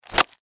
Household Newspaper Squish Bug; Splats; Objects

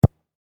Soccer Ball Drop On Grass; Sports

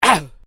MALE VOCALIZATION EEGH

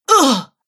Female Fighter Grunts; Grunt Sound Effects

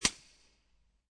44 Suppressed Pistol Barrel; War; VRSonic

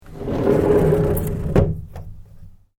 Door Wooden Old Sliding Close; Various doors; Gates; SoundEffects Foundation2

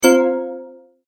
Multimedia Tone-Stop; Tone Sound Effects; Sound Design

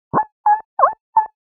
Beep And Bleep Sequence; electronic; Andrew Fleming

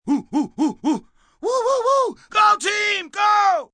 Cheer Hooting, Cheering, male; Crowd Sound Effects

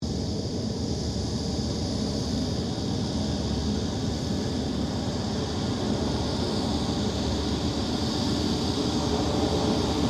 2007 KING AIR 350 TAXI; Airplanes (Sound Effects:Vehicles)

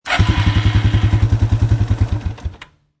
Quad Bike Start Up Shut Off Engine Perspective; Quad Bike Start Up Exhaust Perspective. Quad Bike. Motorbike, motorcycle type Quadbike.

